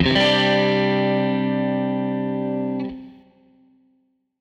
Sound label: Strat Guitar Loop